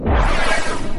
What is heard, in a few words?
evo sound; Arc of Templar